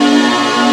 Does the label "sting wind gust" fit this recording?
No